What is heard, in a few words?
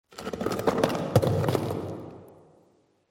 cavern excavation rockslide; ambient, rocks